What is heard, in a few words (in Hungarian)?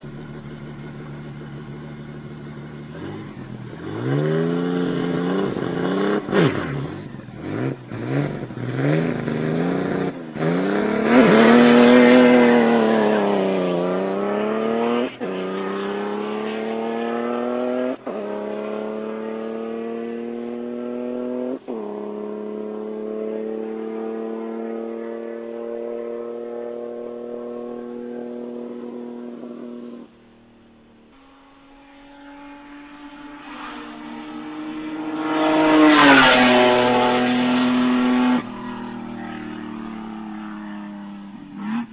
ERA B Type, motorhangok; autosport